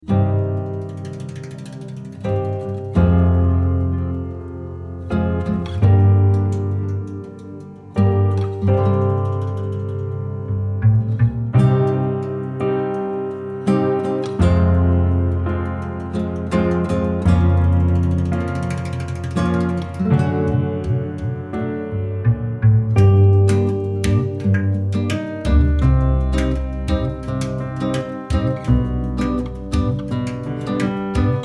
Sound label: floating acoustic